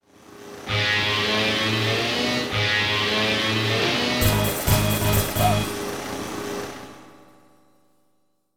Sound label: unlimited Over Bend All; The play all will play all channels for that interval